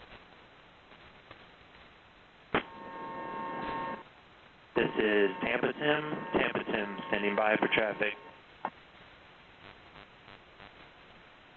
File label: STANDBY, numbers stations